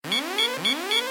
missile warn, combat, aircraft

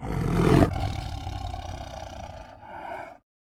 tigergrowl; general mutariansi

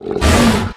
attack; CosmicRage; combat; creatures; kaltz

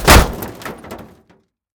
metal; CosmicRage; combat; double swipe

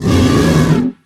hurt; CosmicRage; combat; creatures; kaltz

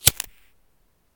lighter; general combat enemy

